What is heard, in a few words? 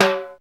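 DR550 high timbale; 90 sSampleCDs; Crypton - DrumBase5000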